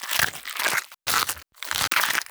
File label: Skeleton Archer Movement